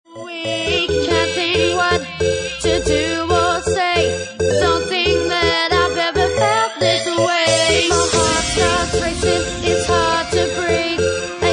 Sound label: Bassline; Bassline Meltdown Session 1